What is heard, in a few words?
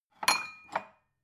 glass take soft